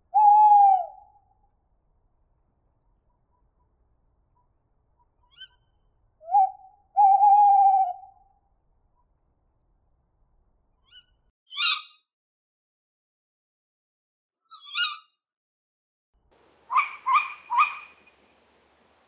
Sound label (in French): chouettes hulotte couple